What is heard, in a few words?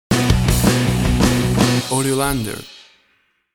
Vintage 80s Heavy Metal Music Stinger